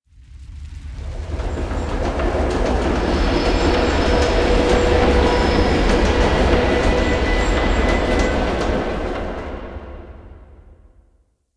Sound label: Asteroid Mining Machines; Ambience; Warframe; Grineer Asteroid